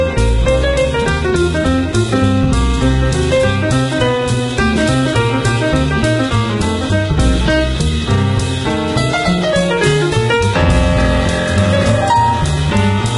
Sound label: jazz drm; Jazz mit 17.46 kBit/s; DRM-Empfängers für 3995 kHz